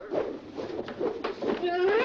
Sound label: spin chair